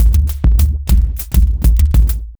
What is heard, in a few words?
Tribal Seq, Beats; Sonic Foundry (Sony Creative Software); Ma Ja Le Ethereal Textures